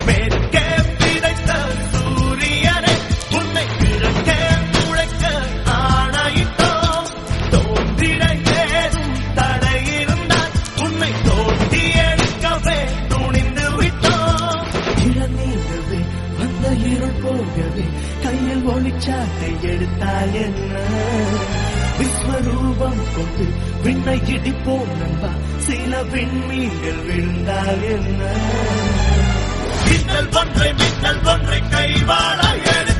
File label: Tamil Ringtone; Citizen